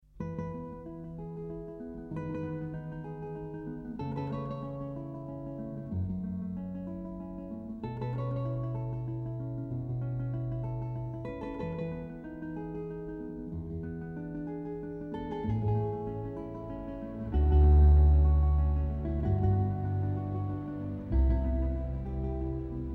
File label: echo just apparent repeats off, guitar pt2399; connection and testing